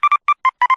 phone

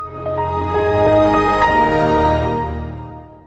start; windows xp

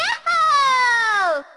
yahoooo; toad

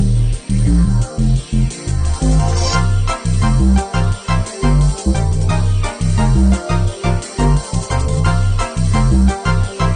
main menu music; mario kart wii

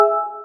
gchat

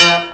clank; billy and mandy

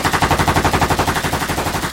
ak-47 shooting

